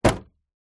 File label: car door close volvo 850